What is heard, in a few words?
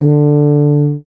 Tuba D4; DirectX SDK; DMusProd